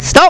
stop; War of Flowers 1.1

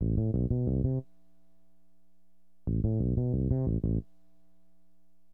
BASS, HipHop; LiveAct Pool

